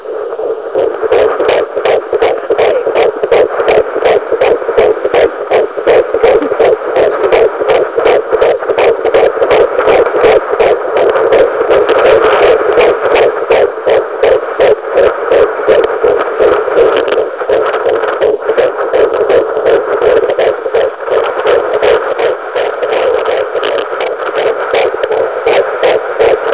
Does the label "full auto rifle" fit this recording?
No